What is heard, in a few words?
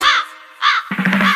Caw; Awkward Silence